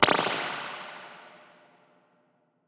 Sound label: 60s Spring Reverb